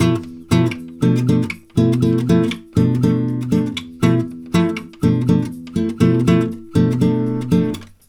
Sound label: 120 BDDIM, Brazil Bossa; USB Soundscan; AKAI